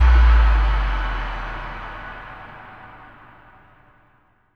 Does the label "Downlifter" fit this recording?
Yes